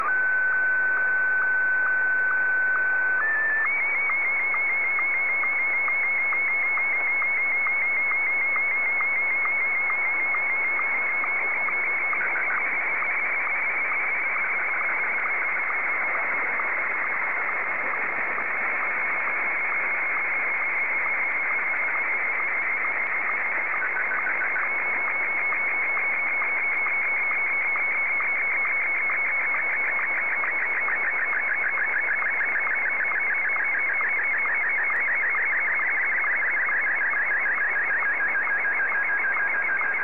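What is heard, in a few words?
SSTV, Martin 1; ZL2TJM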